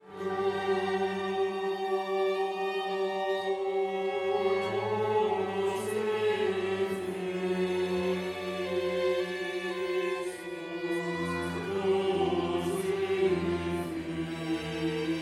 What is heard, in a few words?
Credo Crucifixus; Missa solemnis